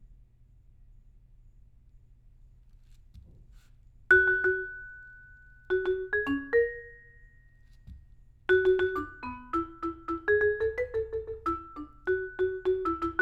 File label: Vibraphone